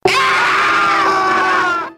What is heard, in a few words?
scream cartoon; ooh ahh